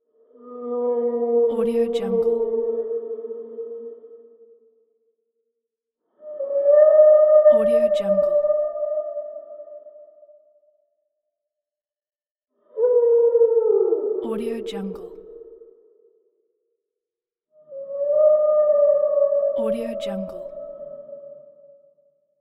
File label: whale